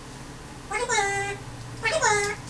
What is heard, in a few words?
pretty bird